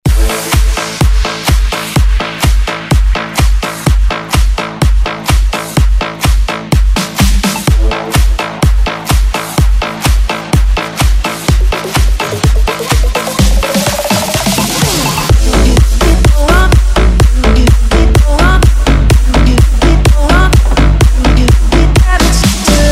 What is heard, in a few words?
Bounce